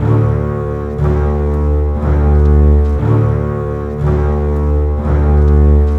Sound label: Strings; Sonic Foundry (Sony Creative Software); Orchestral Series VOL-4; Rock and Pop; Rock-Pop 14